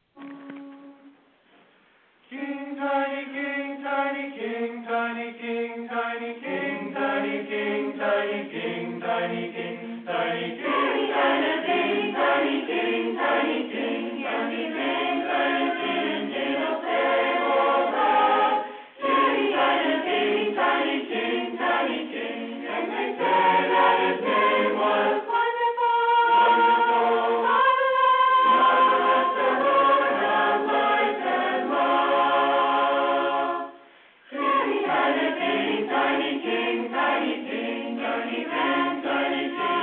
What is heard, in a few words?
chorus